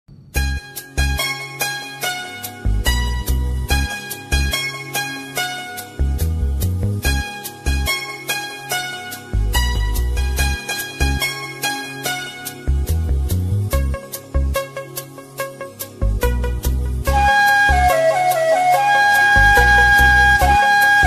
love bgm ringtone classic bgm mobile ringtones tamil; thammudu movie